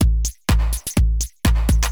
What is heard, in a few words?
drum loop house 125; eJay